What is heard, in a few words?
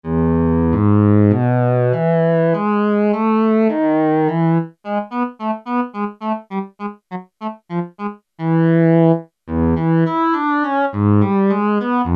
BOW CELLO, Kawai SX-240